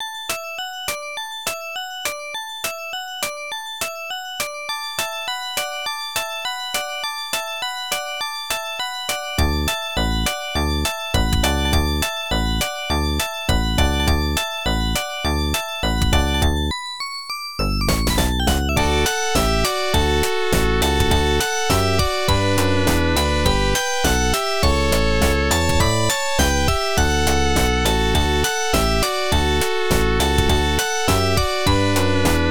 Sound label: Jingle Bells; UFO Catcher 21 (Arcade) (gamerip) (1996)